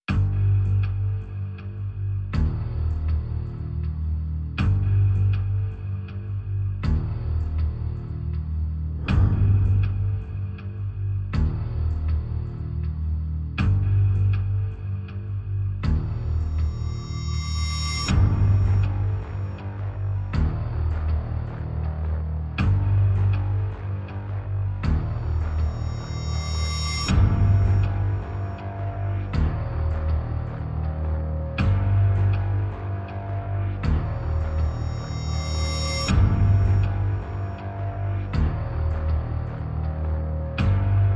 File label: Pulses Only